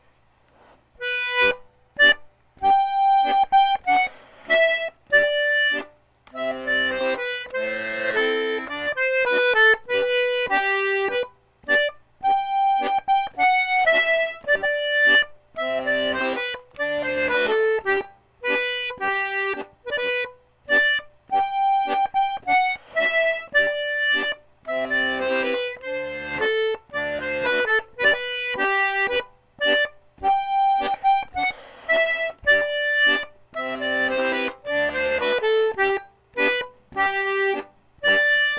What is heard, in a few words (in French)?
scottish lente; Planard